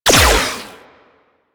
Beam Shot Fire; Glaxion Beam Moa

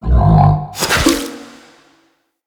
Camel Idle Spit; Zenith Camel Vendor; Vocalizations

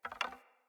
Hunter Bow Wood Rattle; Weapons; Player; Envoy; Bows

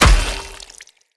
Polearm Large Impact Flesh; Weapon Impacts; Enemy

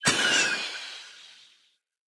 Bow Arrow By; Weapons; Josalfar Bow

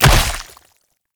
Great Sword Impact Flesh; Weapon Impacts; Player; Swords; Combat; Founders Great Sword

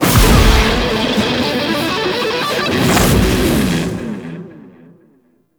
Solo Cast; Warframe; Temple

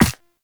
Operator Run Ice, Footsteps; Warframe

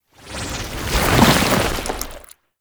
Pool Noodle Heavy Attack, melee

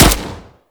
CY Xbow Shot A; Tenno

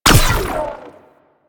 Space Fighter Laser Fire; Space Fighter Laser Agent

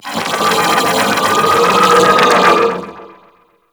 Vocal Idle; Warframe; Amalgam Override

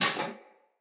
Foley Armour Step L; Enemy; Mockeries

